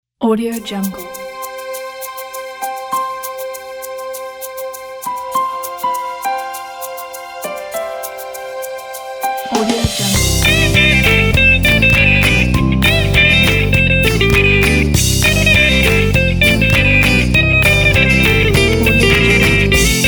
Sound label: puppy love ident; cubesound